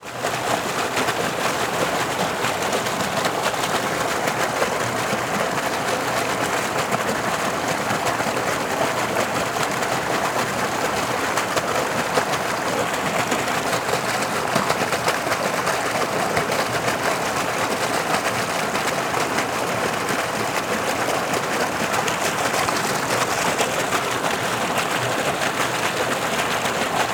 Pequena queda dagua com esguicho

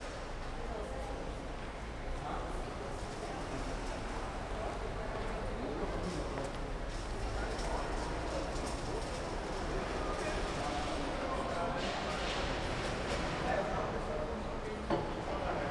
Ambiencia chapelaria senado com pessoas, carrinho, walkie talkie e alarme; alarme sonoro

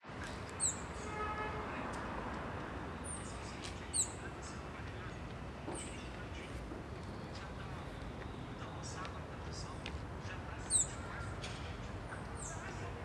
Ambiencia em Quadra Residencial Proximo a Entrada de Carros sem Transito; ambiencia urbana

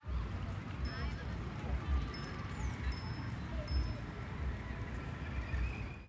Bicicleta Passando e Tocando Buzina de Sino; esportes

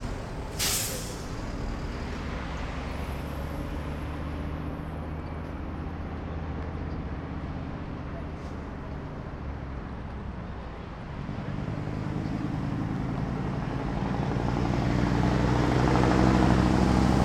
Transito com caminhao passando e poucas pessoas

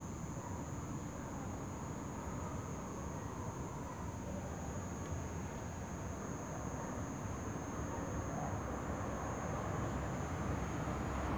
Ambiencia em cima de casa anoitecer cigarras, grilos, cachorros tudo longe; colecao sonora do cerrado

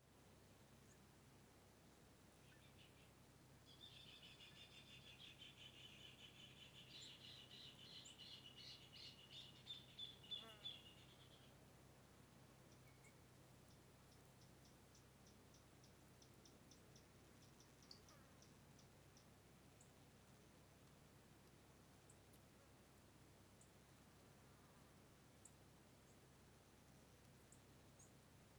Estrada rural silenciosa passa uma carro passaros e insectos mosca; ambiencia rural

